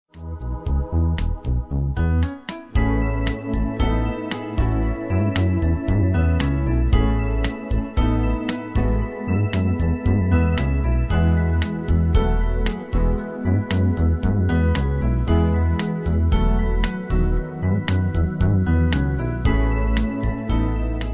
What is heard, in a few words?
newage